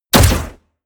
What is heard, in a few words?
rifle assault rifle fire; comedy-cartoon; Pistols and guns; pistolen-gewehre